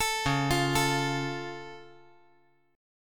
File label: Db Augmented, down; arpeggiated; JGuitar